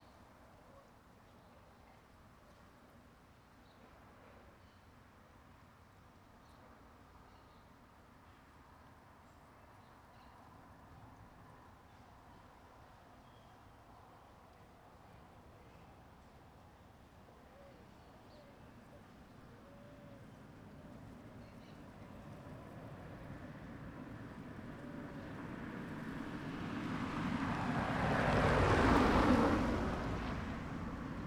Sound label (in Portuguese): Carros passam um bem depois do outro com vento suave, folhas e passaros; lago norte